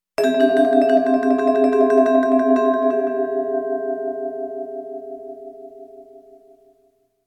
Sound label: Vibraphone Suspense Roll; horror sounds; Hollywood Edge